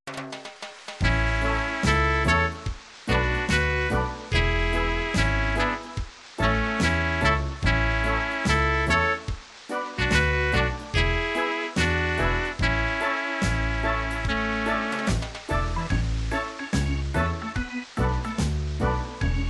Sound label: reggae